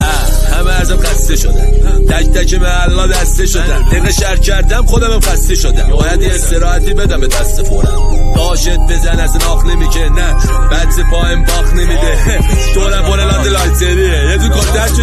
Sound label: music rap farsi